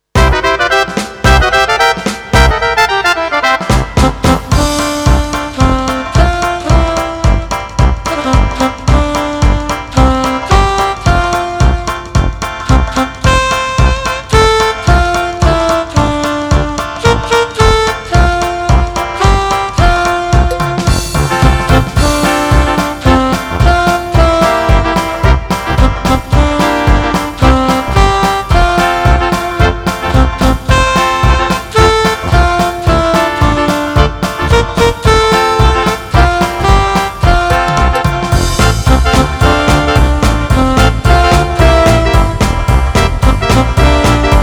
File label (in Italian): Tanti auguri Melodia